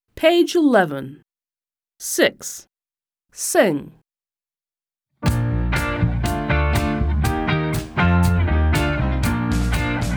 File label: American song M to O; My alphabet book; Smart Book 1